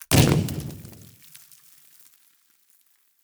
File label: explosion